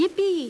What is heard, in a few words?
Yipee; Primeval